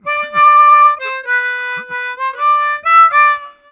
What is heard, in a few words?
Harm 129bpm G, Harmonica